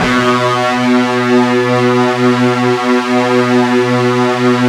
FUZZ, Guitar; ULT FZZ LOOP; East West; Steve Stevens Guitar Samples Collection

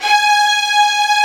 STR STRING, Orchestral Strings

